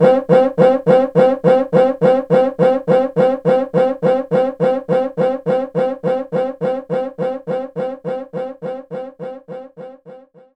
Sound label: Cuica Open; knights try